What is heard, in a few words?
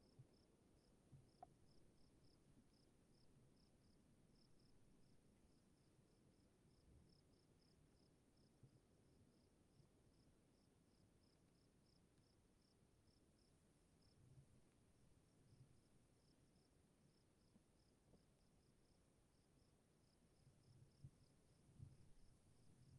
crickets quiet; lime hill; sonniss gdc